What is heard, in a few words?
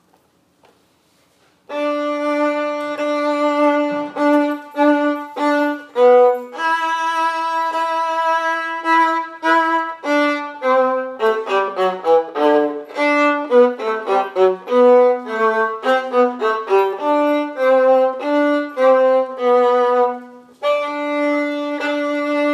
Viola; The Parade